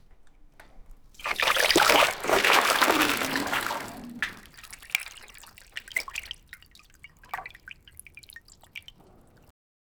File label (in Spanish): el agua de una pileta, leakage